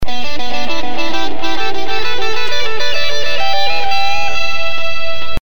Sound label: pentatonic